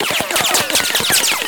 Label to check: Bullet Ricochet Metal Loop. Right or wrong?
Right